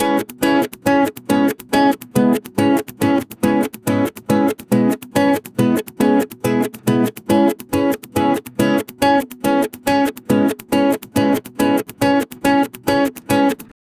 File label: reggae skank, music